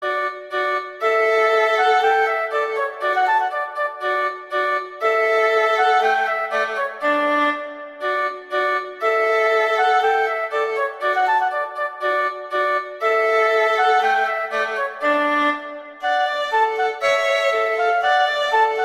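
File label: Duet, horn; Mozart; Duets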